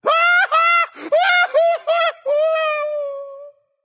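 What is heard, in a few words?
non verbal fear, voice; cernunnos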